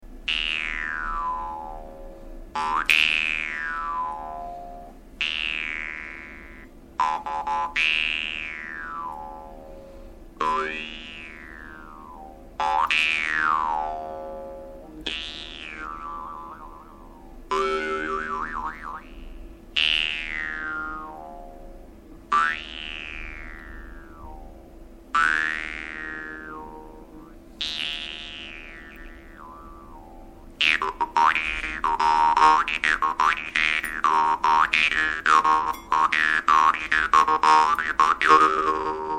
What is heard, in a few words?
vargan; ignatiev